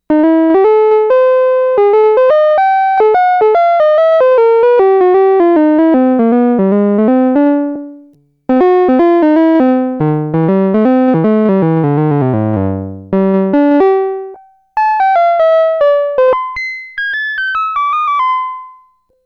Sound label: Piano, Roland SH-2000